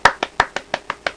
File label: claps